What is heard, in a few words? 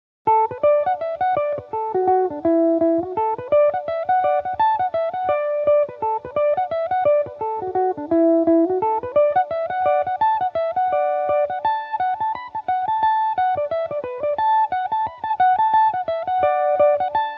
reel D major, Irish mandolin; Anderson's Reel